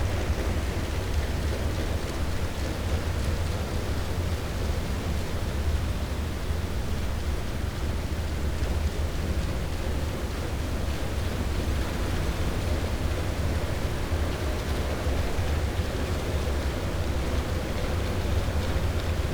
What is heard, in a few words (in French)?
cachalot negatif, Physeter Macrocephalus